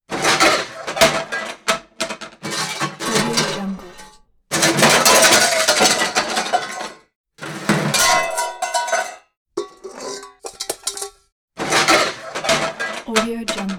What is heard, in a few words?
pots and pans crash metallic clanking wobbling; prosoundfx